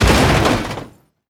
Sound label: Car Damage Medium; gamerip; Car Simulator 2; android